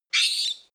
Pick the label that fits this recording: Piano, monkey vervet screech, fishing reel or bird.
monkey vervet screech